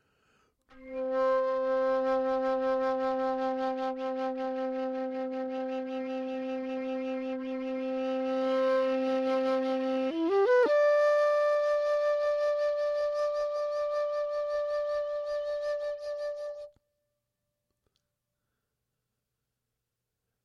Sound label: Flute No Echo